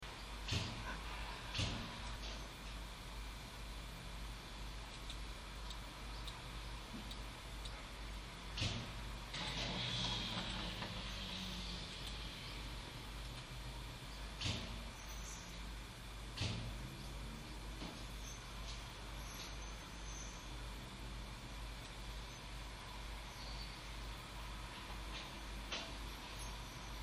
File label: genkan close open